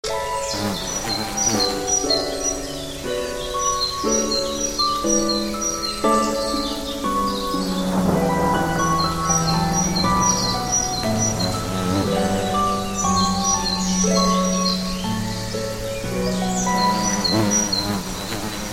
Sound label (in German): natur; spiellandschaft stadt